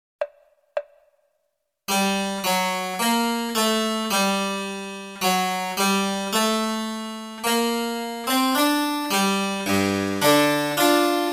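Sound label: flute sonata BWV 1030, oboe; bach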